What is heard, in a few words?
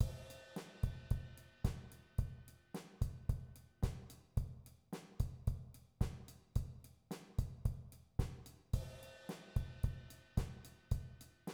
rock background